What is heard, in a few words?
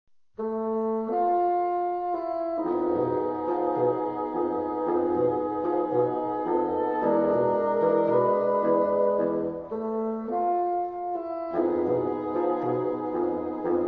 tango